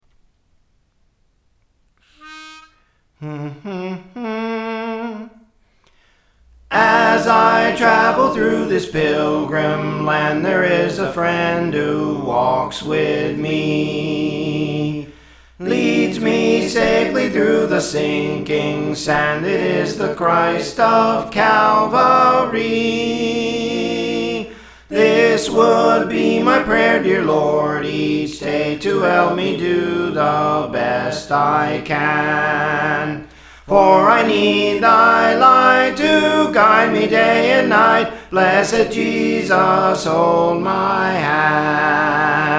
Soprano; Praise For The Lord Training

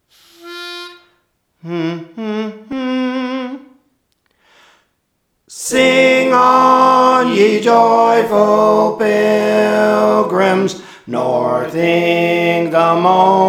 Soprano; Praise For The Lord Training

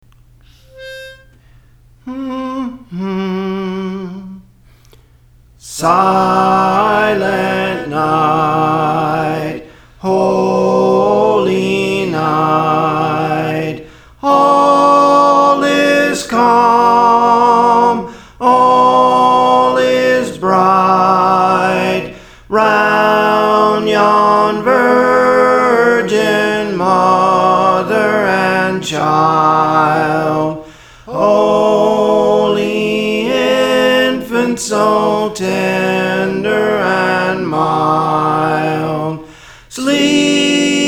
Soprano, Praise For The Lord Training

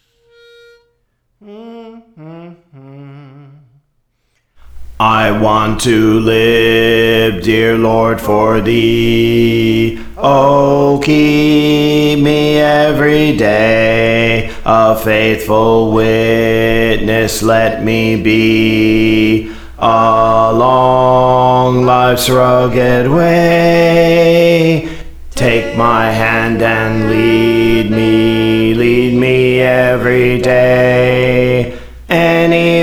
Bass; Praise For The Lord Training

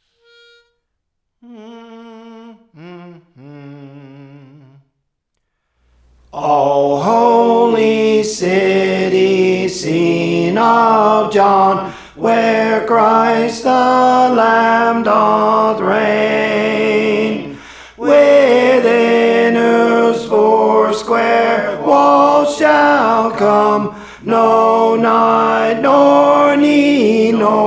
Tenor, Songs; Praise For The Lord Training

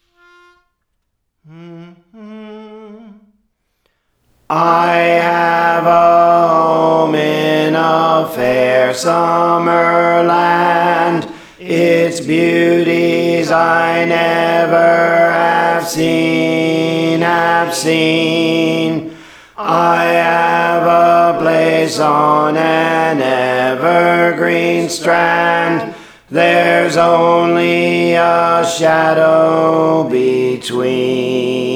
Alto, Praise For The Lord Training

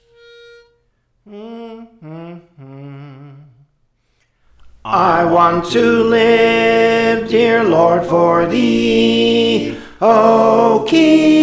Tenor; Praise For The Lord Training

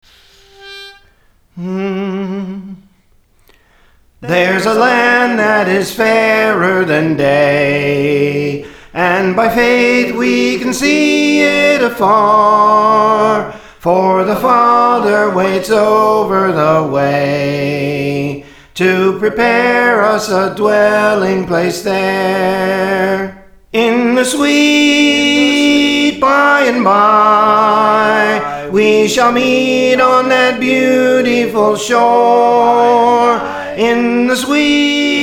Soprano, Praise For The Lord Training

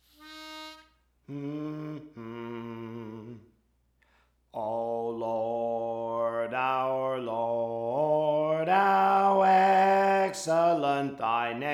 Soprano, Songs; Praise For The Lord Training

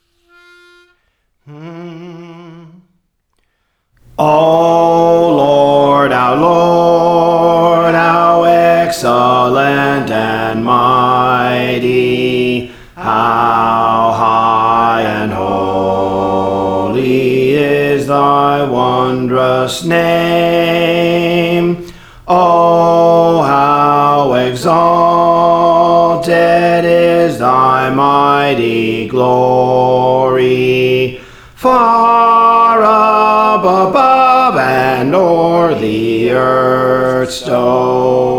Bass, Songs; Praise For The Lord Training

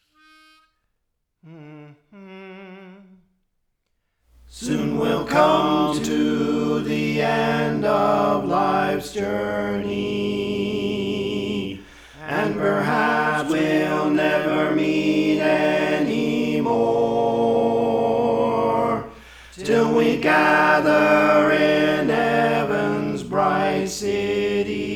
Tenor; Praise For The Lord Training

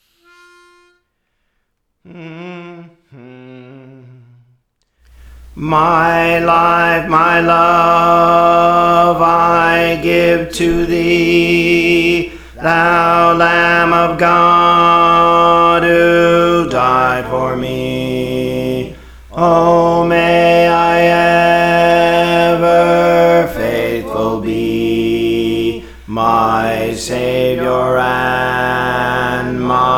Bass; Praise For The Lord Training

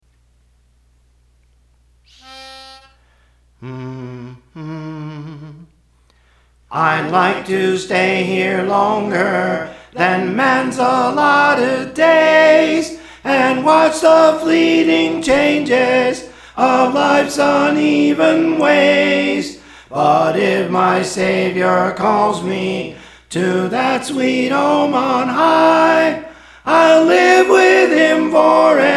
Soprano; Praise For The Lord Training

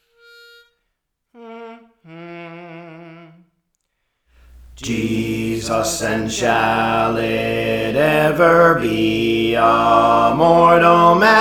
Bass; Praise For The Lord Training; Jesus and Shall It Ever Be

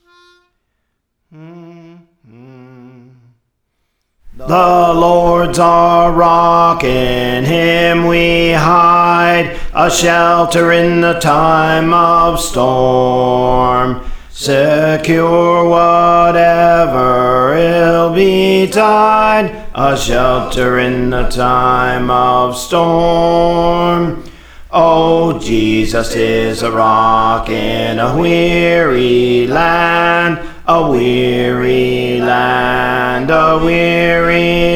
Bass, Praise For The Lord Training

